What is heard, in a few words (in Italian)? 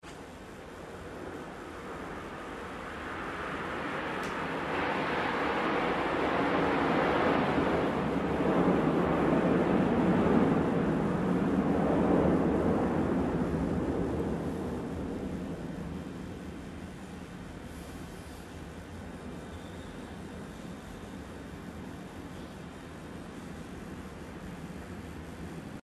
frecce; rome